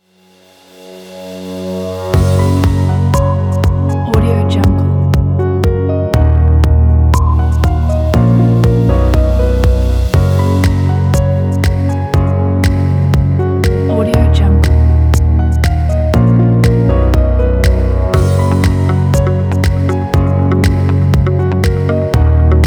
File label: uplifting emotional piano